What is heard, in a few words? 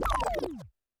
percussion, Roland TD-20